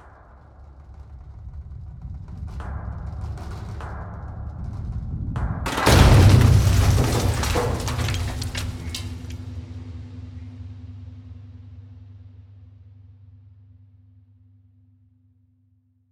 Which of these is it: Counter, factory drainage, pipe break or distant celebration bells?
pipe break